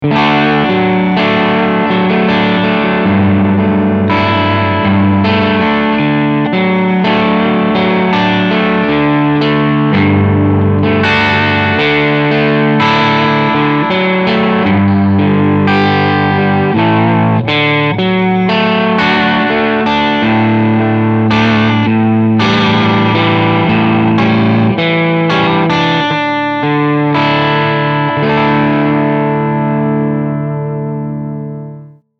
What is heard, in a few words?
Medium Gain